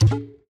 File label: just the plop; battle